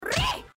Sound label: oreeee; usada pekora